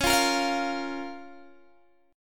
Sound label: Db 6th, Guitar, Open G